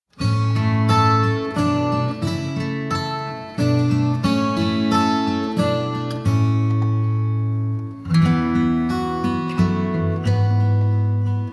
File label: Koala Rock a Bye Instrumental